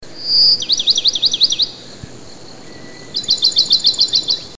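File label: sound of thrush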